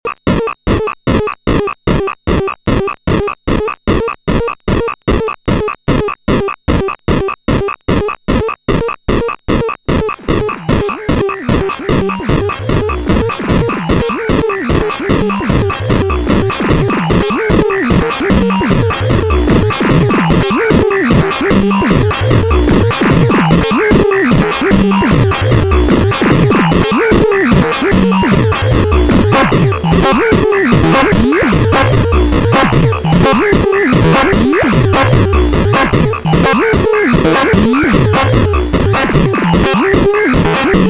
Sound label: HSS2020 thru HSS2020